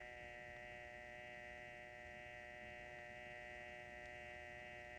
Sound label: dial tone; Sony Pictures Sound Effects Series; Period Devices